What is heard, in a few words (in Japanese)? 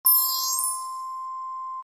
kirakira